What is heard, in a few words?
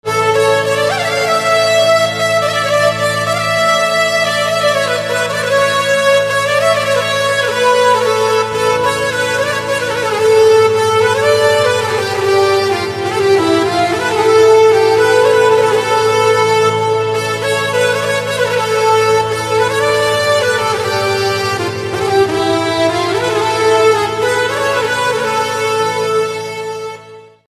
Sytrus Ringtone, instrumental ringtone